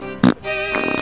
Fart

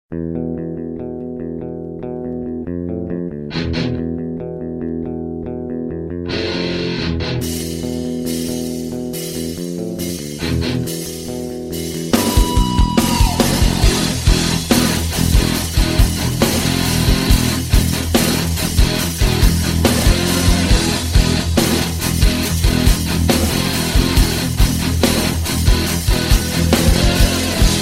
Solo Rock

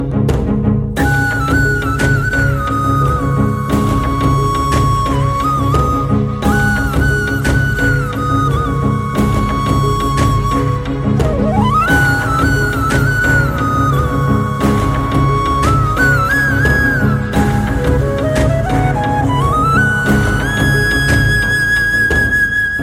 Awesome Flute

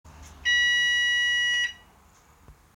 Bus stop bell

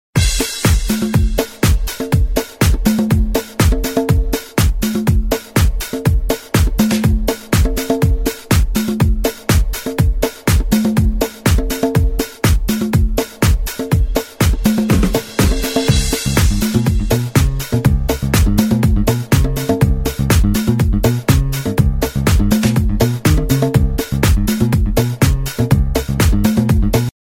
Jazzy Dance

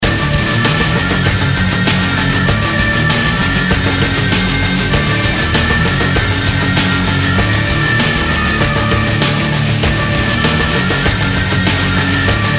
Military Aggression

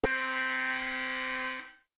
Tone Alert; APX

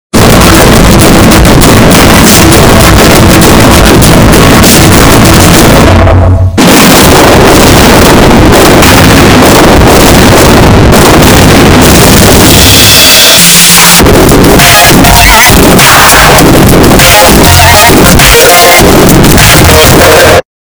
bass bost; fortnite

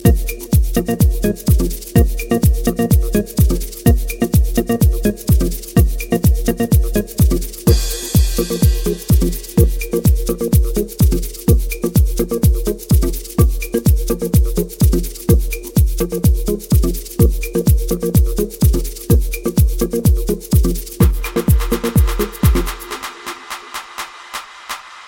Instrumental Sounds

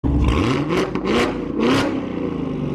Camaro ZL1 Revs

